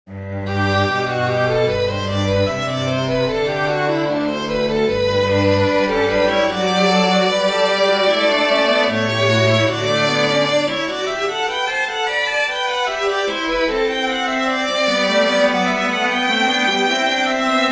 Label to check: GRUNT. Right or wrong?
Wrong